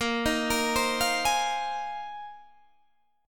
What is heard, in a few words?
Bb 7th Suspended 2nd Suspended 4th Bb, Guitar Open D; 20,18,20,18,20,18